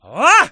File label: Banana Neg; Food Truck Game